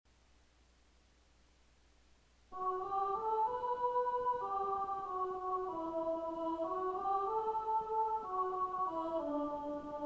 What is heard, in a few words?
Melody, choir